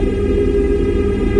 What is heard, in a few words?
AMB. MACH; Rarefaction; Poke in The Ear With A Sharp Stick